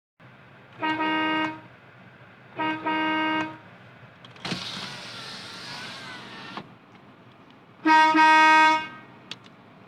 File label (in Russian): signal